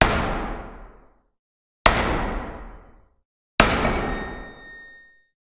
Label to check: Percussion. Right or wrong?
Wrong